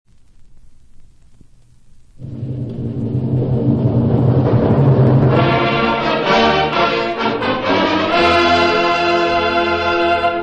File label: Fanfare